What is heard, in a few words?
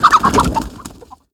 Hit Hen; Toy Story Mania; ios gamerip